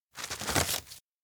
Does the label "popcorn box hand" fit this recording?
Yes